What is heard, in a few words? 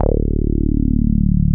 BASS, Synth Basses; E-MU Formula 4000 Series Vol. 2